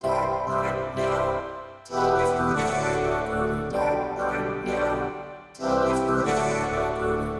vocoder G maj 130 bpm; bespoke - Floral v.1

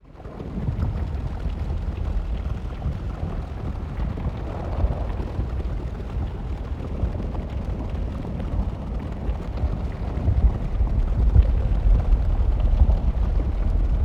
dark ambience; fx; base pack

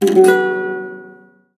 Badly tuned guitar; SFX; 7rystan's 600+ FREE EDM SAMPLES & DRUMS

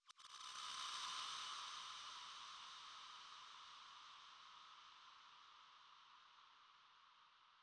impact; fx; bespoke - Floral v.1

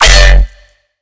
bass chunky stab c sharp; phucsmink; base pack

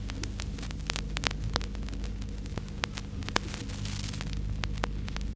Vinyl; SFX; 7rystan's 700+ FREE COLOSSAL EDM SAMPLE PACK

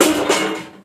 Dropping metal, Foley; SFX; 7rystan's 600+ FREE EDM SAMPLES & DRUMS

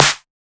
snareclap weird; drums; base pack

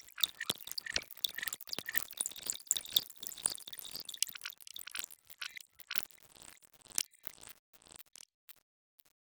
foley; drums; perc oneshots; bespoke - Floral v.2

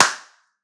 clap; base pack; drums

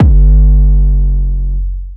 Bass; 7rystan's 700+ FREE COLOSSAL EDM SAMPLE PACK

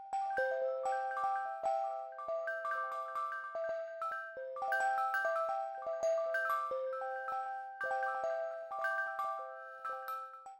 pad granular bell C; base pack

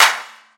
Clap

